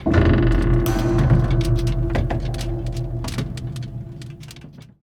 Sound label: objects interiors